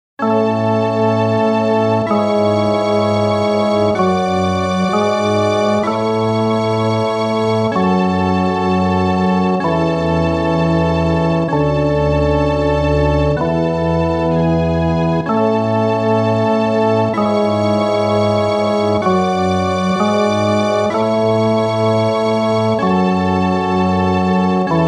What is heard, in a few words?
Church